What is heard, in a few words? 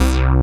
MOOG BASS